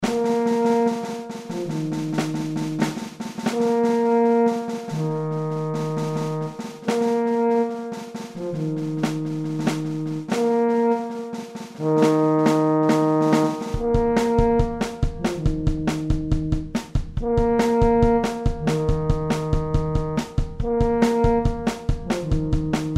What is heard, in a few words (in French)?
tuba batterie